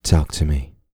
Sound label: sultry, vocal, hip-hop; TB HIP-HOP